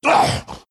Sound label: pain; lycan